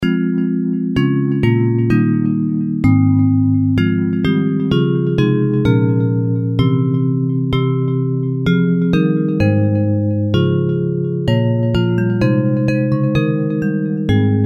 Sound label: Bells, Traditional hymn